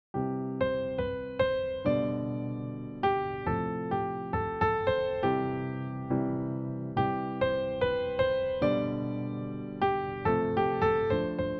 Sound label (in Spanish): Posiciones de los acordes